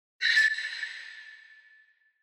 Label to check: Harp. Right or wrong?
Wrong